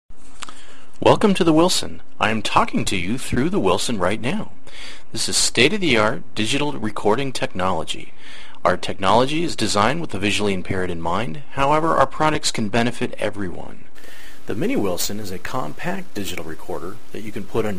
classic wilson recorder

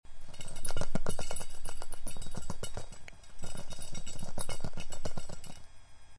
toy ball

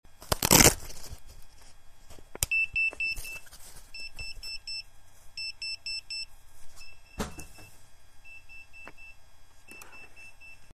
beeping frisbee

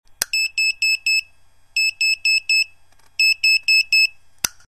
beeper box

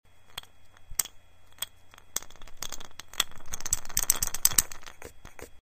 lost marble

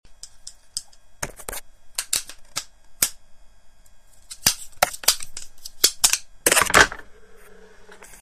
folding white cane

